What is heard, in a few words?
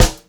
96 POP, Pop-Rock Drumloops; USB Soundscan vol.37; AKAI